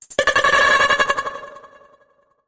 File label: Weird Stutter Vocals Sweep